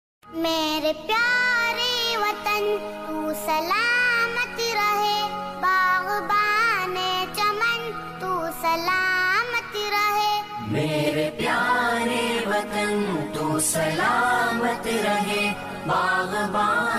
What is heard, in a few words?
naat ringtone; naat sharif; islamic ringtone